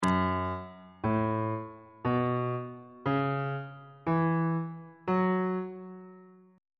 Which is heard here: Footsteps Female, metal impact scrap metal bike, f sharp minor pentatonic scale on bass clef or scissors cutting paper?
f sharp minor pentatonic scale on bass clef